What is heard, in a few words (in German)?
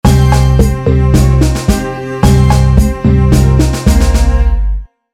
orientalische Musik in Dauerschleife; Landsberg